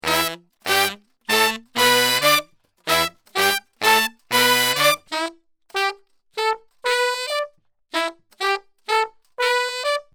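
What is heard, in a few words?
Section and Trombone Alto Sax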